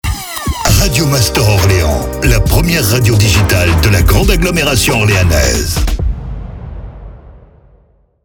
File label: Jingle MEDIUM; Orléans; Radio Master ORLEAN